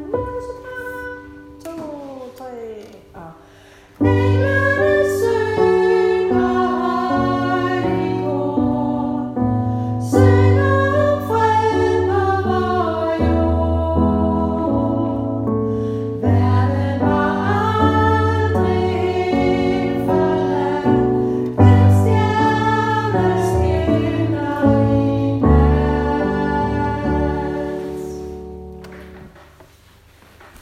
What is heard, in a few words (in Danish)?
sopran; en stjerne